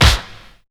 DRM SN; USB Soundscan vol.02; Underground Hip Hop; AKAI

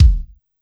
BD Rock, Yamaha PSR-E323, Rock Kit

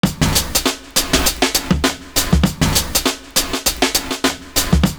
LAUGH BEAT; Univers Sons

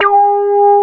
FRQ SQ DECAY

